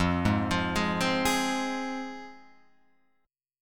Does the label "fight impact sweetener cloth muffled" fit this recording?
No